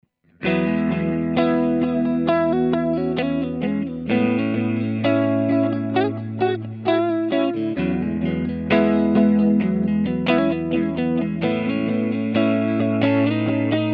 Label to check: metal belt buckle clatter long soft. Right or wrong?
Wrong